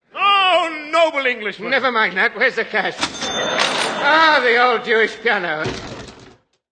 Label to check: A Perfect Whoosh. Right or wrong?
Wrong